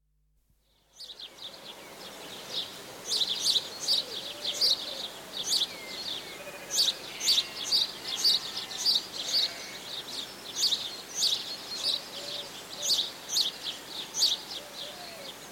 Atmosphere in farmyard, summer afternoon, with house sparrow calling, woodpigeon, collared dove, chaffinch, rook; Outdoor Sound Effects; BBC